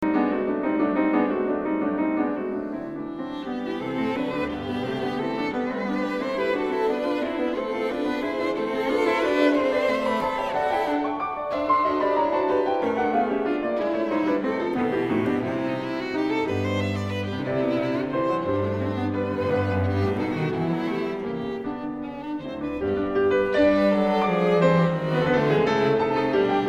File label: Piano Trio Scherzo Con Moto Ma Non Troppo